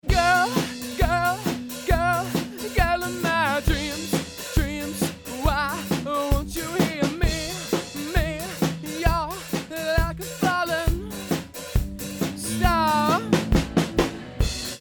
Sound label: Drums Vox; Meld Ghost